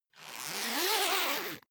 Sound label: zipper pullover jacket open